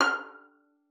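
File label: violin pizzicato F5; Xsample VOL-5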